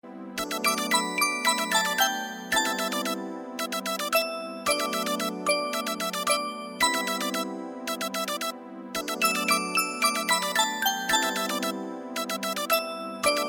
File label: Apple business tune